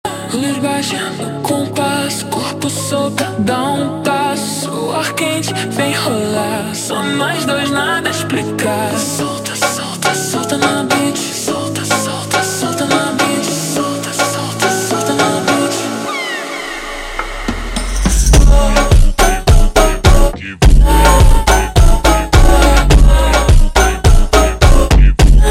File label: super Slowed, funk